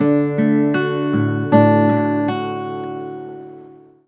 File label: LUTE